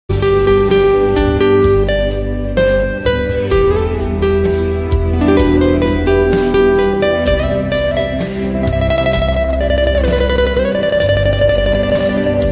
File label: Spring Flowers Bloom Ringtone